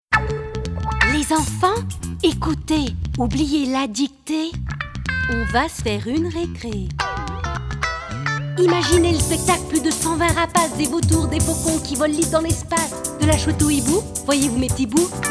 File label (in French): Rap maman, voix chantees, publicite